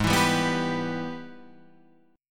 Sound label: G sharp Minor Major 7th Sharp 5th G sharp down